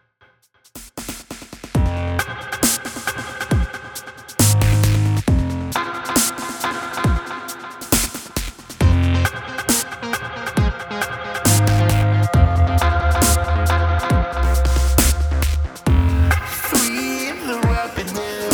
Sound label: rooftop dub